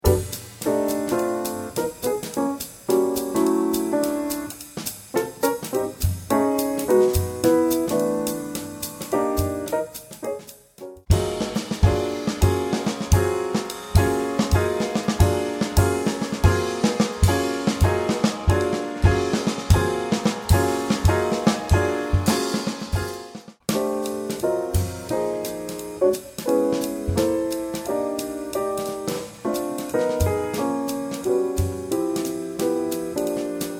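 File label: pno dr, piano drums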